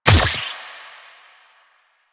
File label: grenade bounce; Warframe; Lasrian; Lasrian Soldiers; Weapons; Lasrian Goonadier Grenade